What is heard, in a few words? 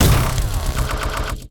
body mech deploy sequence, Assault Mech Robotic War Unit; Bluezone